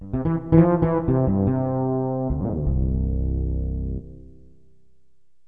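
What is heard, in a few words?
fretless bass; gm-sound; Roland; An Invitation To The Roland World Of Music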